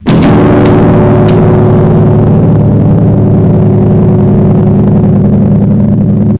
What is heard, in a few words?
sprayer